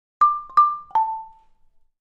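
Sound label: Marimba Accent Short; mallets marimba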